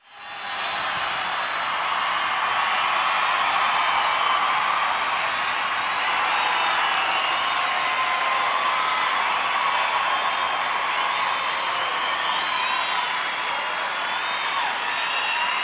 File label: Crowd; Ultimate Fighting Championship; PSX